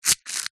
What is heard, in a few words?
leisure toy aerial disk launcher cord pull spin